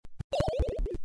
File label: TELEPORT SND; commander genius